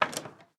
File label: Ladder Step; Game rip; MiSide